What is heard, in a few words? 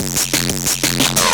Industrial Crunch, Drum Loops